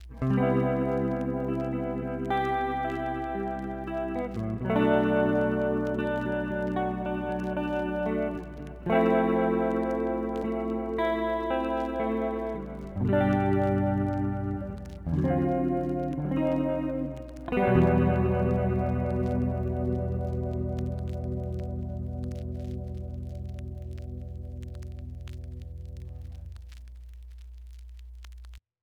Vintage Vibrato Noise Machine; Guitar Rig 7

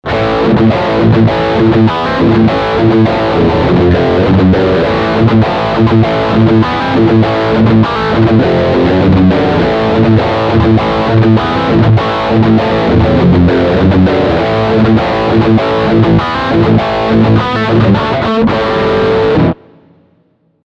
Riff Bridge Humbucker

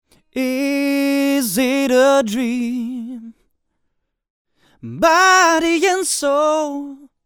Vocals DRY

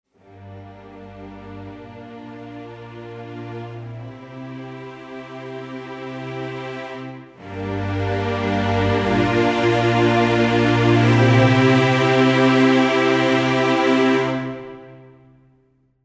Full Ens Sustained; Audio Imperia Areia

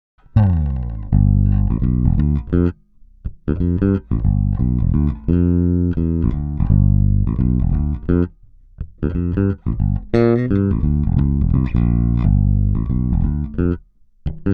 MXR 83 Finger X Over on

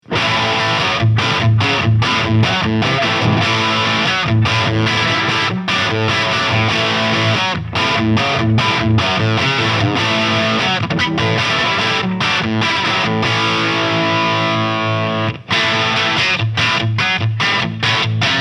Chapman Guitars ML2 Pro Crunch; Azure Blue

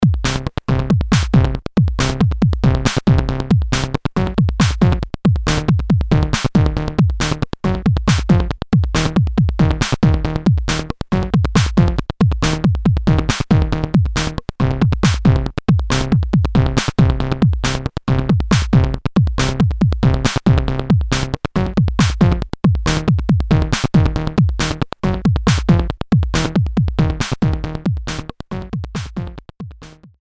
AKAI MPC60

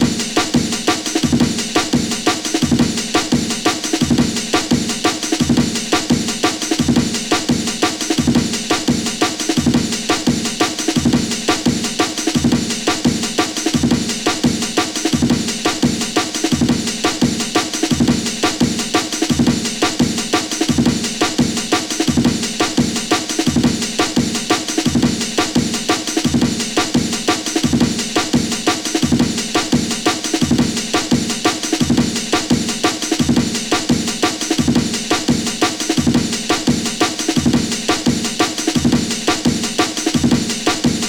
Boss DE-200 Digital Delay Sync Amen Sampling

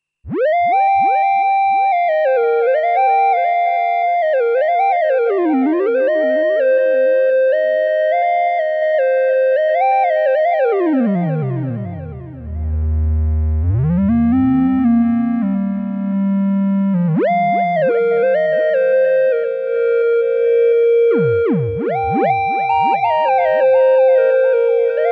moog theremini eire mit pc min penta

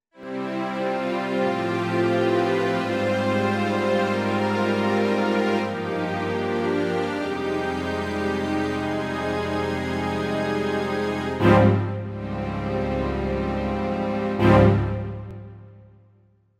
Strings

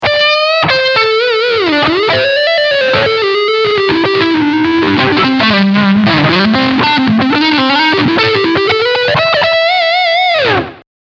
Front SC Leadline

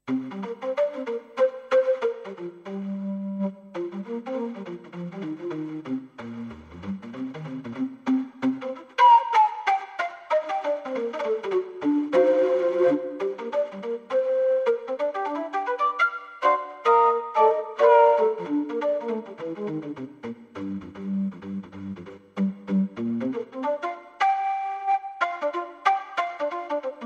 Expressive E Osmose flute Traverse

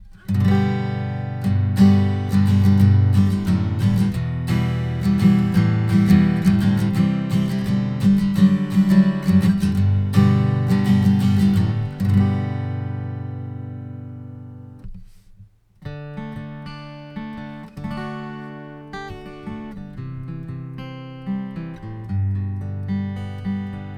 TBone CC100 Acoustic Guitar 15th Fret

